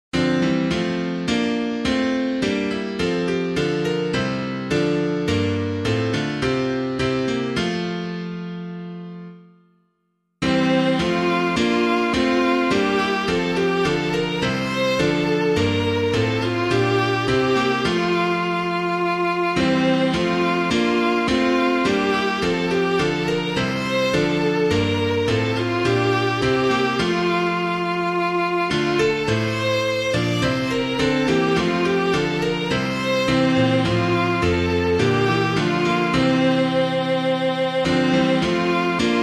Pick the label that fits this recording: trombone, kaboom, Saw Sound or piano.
piano